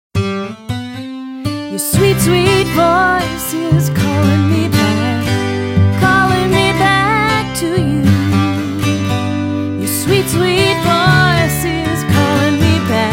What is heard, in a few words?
Sweet Voice, Nokia Tones; Windows Phone apps